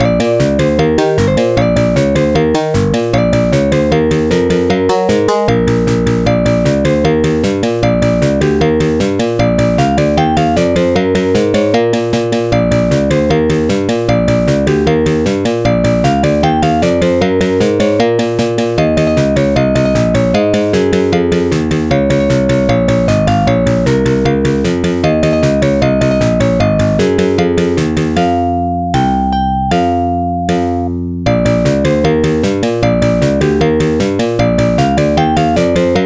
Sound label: arcade background music; bomb jack